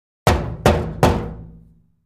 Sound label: Cabinet Door Knock